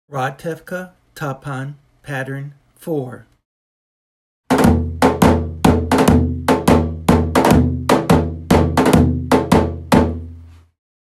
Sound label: tapan; Ratevka